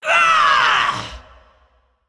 Female Dragon Death

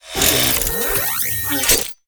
Boom Bot Enter Play

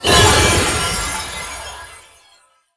Elementium Geode Death

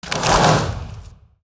Natural Wood Staff Underlay Attack

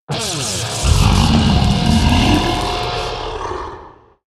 Static Waveform Play

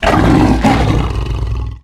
Enter Play

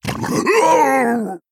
Male Quilboar Death

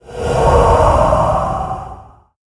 Lightspawn Death

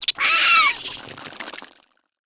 Spider Play

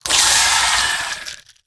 Boneweb Spider Death

